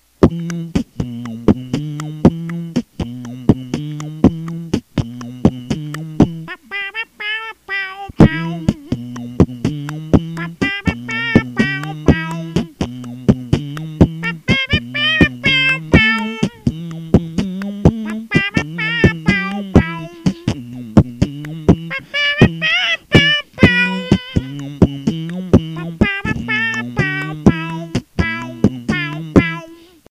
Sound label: Wah Routine, beatbox